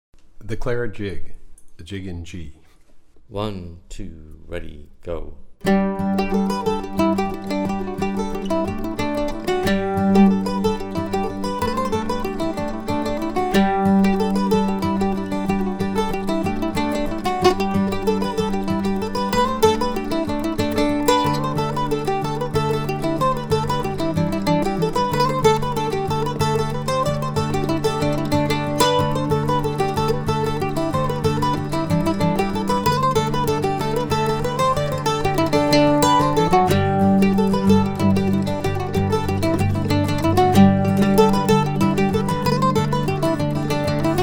Clare Jig

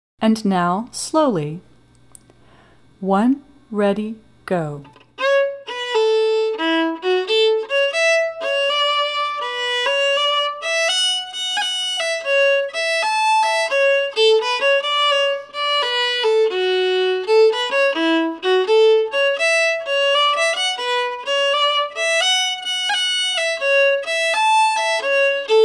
Hornpipe slow

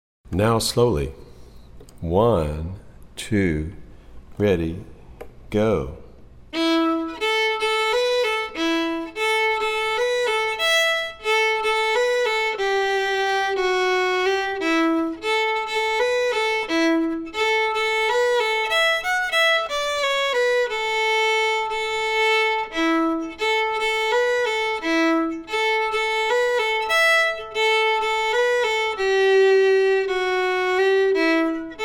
Slide slow, Irish music

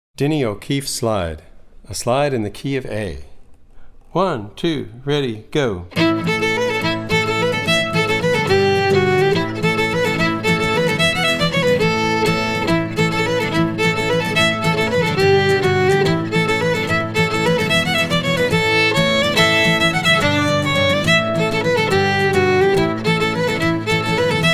Slide

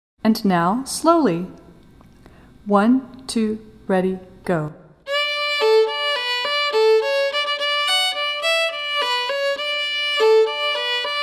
slow

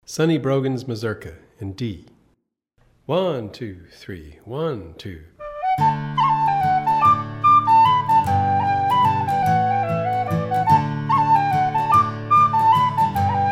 Mazurka

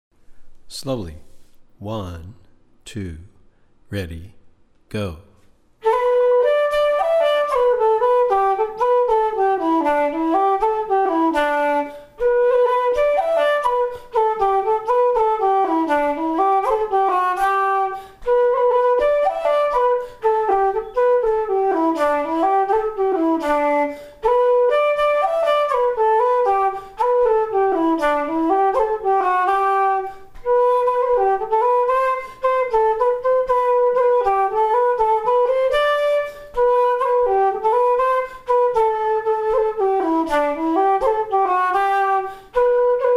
Jig slow

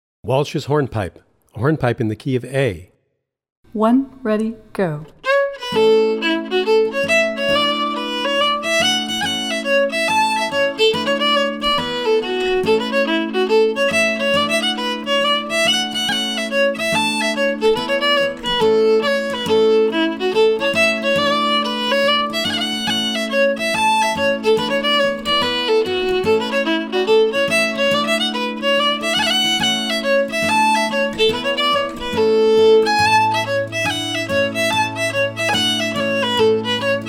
Hornpipe

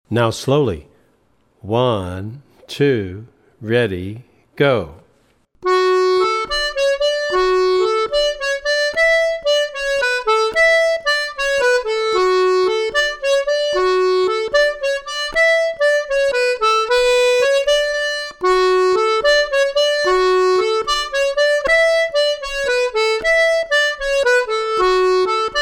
slow

